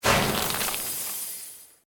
Created Magic Spell Ice Bolt; Series of created spell sounds

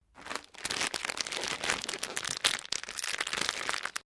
PLASTIC WRAPPER MOVE; Plastic Sound Effects; Plastic (Sound Effects:Materials)

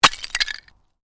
Ice smash light, Ice: small piece of ice smashing; Snow; Weather; Stuart Duffield

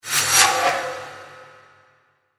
Production Element Whoosh Reverse Airy; Whooshes; Sound Design

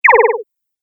Space Future Communication Sound, sci fi

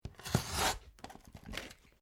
Cardboard cut; Material components for constructing more complex sounds; Materials

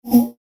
Dark Science Laser Sweep; Laser Sound Sound Effects; science fiction sound effect

